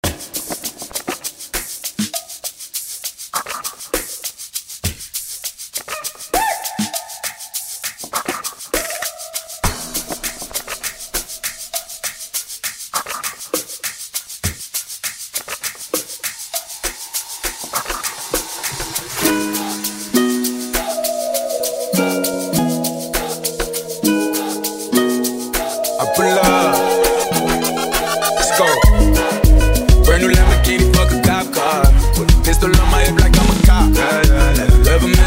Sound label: Amapiano